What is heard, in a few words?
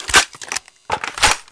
shotgun caravan reload